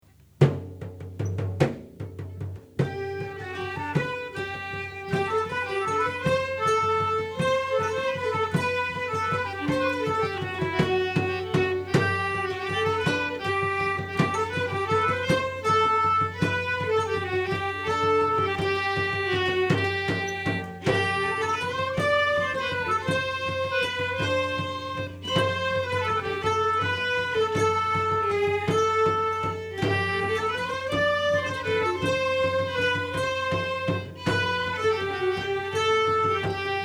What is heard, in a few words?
Bass Dance